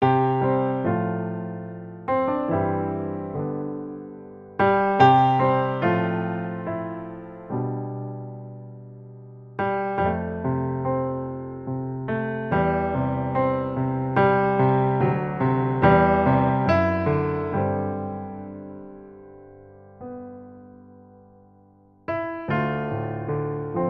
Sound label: Introspective Piano Etude